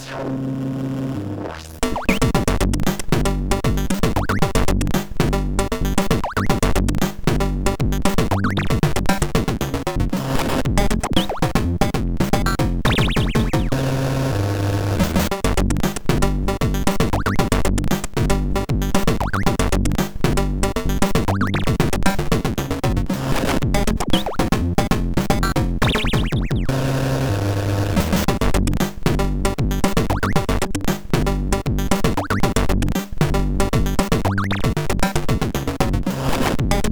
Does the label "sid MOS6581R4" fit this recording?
Yes